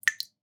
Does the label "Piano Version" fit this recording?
No